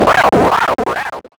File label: Multimedia Internet CD-Rom Flash Hi-Tech